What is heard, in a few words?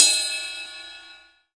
CYM RIDE, DRUMS SINGLE HITS